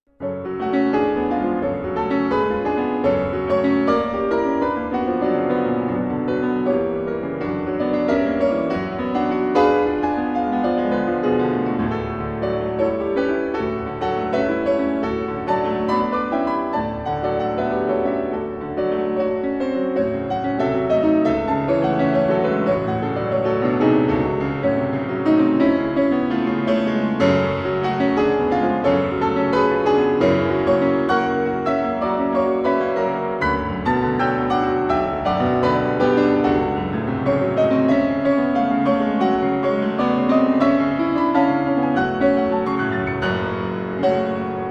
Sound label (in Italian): Allegro appassionato